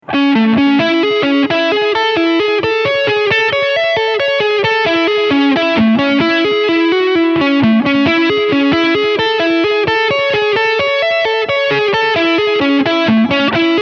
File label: LA maj7